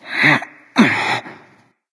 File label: grunt lift; smite; hachiman